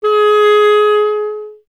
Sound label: clarinet G#; Miroslav Vitous; Solo Instruments VOL-1